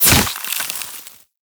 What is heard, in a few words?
Proj Tap Impact Ice; Weapons; Warframe; The New War; Omicrus